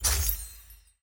ui shop button hover

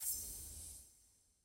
ui edge flare

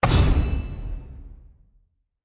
ui match decline; jade

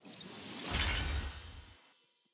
activity center chapter notification

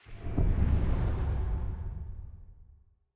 cinematic transition

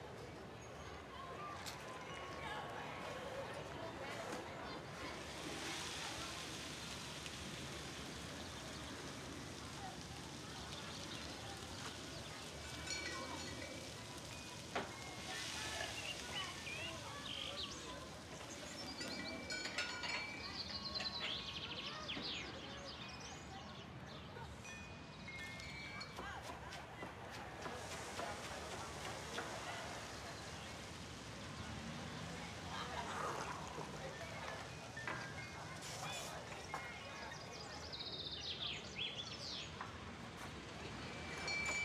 amb

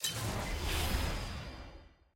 click; skin sett

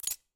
volume bar click; premade voice

